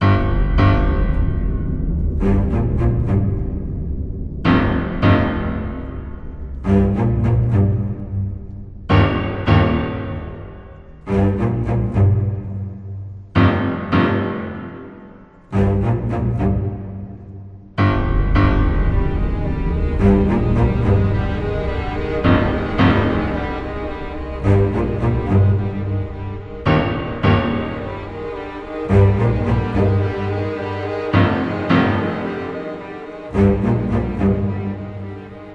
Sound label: Character Die